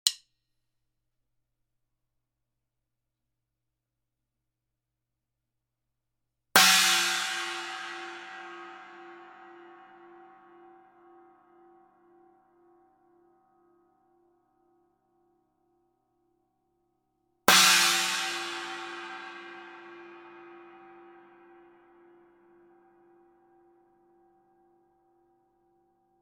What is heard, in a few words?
Paiste Signature Thin China 16, Cymbal